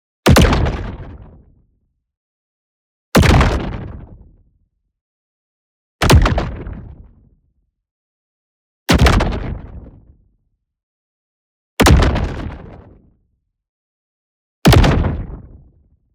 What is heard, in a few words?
EXPLOSION LARGE Distorted Double; boom library; Matter